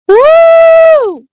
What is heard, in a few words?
woo